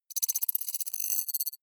digital rattle, signale; soundpool

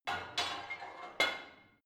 barbell heavy plate put on; sport freizeit; fitnessstudio

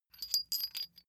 metal chain small handling

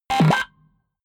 button, signale; soundpool

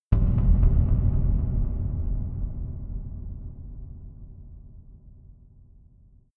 reverby dark impact; hits explosionen; impacts

